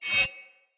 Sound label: Dagger Heavy Whoosh Wind Up Accent; Weapons; Player; Swords; Fey